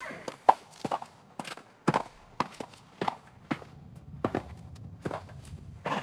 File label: horse hooves stepping on stone; footsteps